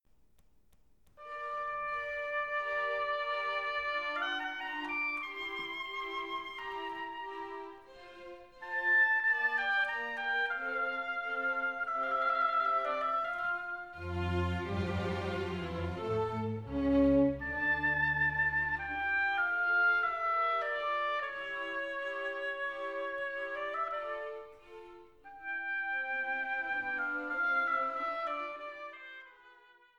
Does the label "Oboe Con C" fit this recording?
Yes